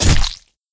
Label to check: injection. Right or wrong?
Right